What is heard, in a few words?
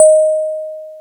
DX PIANO